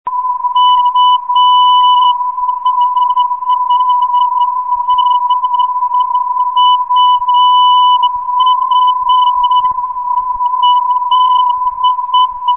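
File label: u h 1065khz, non-directional beacon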